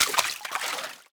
water step; Golden Crayon Sounds

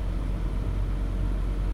fan; Golden Crayon Sounds

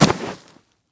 wall grass; Golden Crayon Sounds

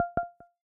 Spatial Profile Scan Error; iOS 27

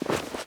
snow land; Golden Crayon Sounds

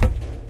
plastic step; Golden Crayon Sounds

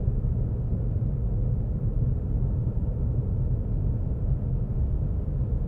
room tone; Golden Crayon Sounds

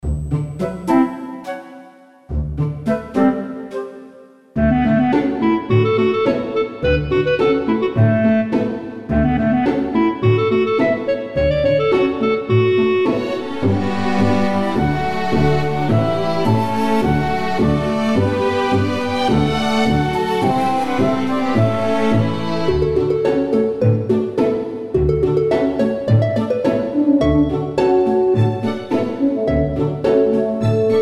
city; Manamon Music; Manamon 2; seulee

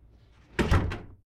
door close; Original TK Sounds

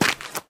ice step; Golden Crayon Sounds

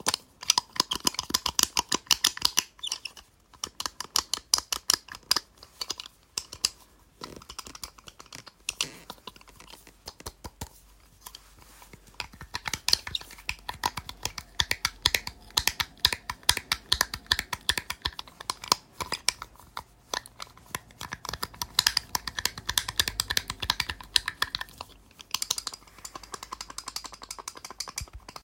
fidget gear stick; Golden Crayon Sounds

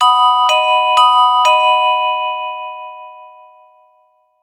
winning score; RS Games Sounds; Farkle

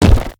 wall stone; Golden Crayon Sounds

